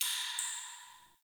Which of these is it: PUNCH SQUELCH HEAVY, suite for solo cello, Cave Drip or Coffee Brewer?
Cave Drip